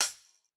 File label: closed hh; eddyflux